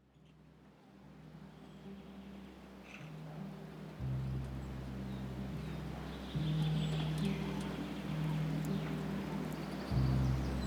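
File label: Nature Sounds - Autumn Nature. Sounds And Noise Of Nature, Forest, Rain; Zvuki Prirody; Priroda Oseni. Zvuki I Shum Prirody, Lesa, Dozhdia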